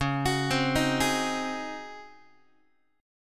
Db Major 7th Suspended 2nd Suspended 4th Db Guitar; x,9,9,10,7,7; DbM7sus2sus4 arpeggiated; JGuitar